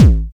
BD, Dance Mega Drums, BD HOU; Best Service

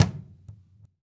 CAJON; Univers Sons - Raricussions

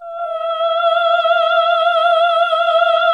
OHH E3, CLASSIC VOX6

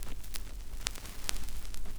SCRCH, Record Noise

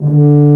Tuba p D2; Digital Sound Factory Virtuoso

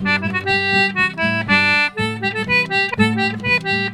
G C3, Celtic Flavours; USB Soundscan vol.42; AKAI

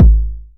909 BD; Goldbaby Tape808 & Tape909; The Tape 909

